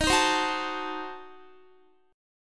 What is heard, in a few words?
D sharp Diminished 7th D sharp Guitar; strummed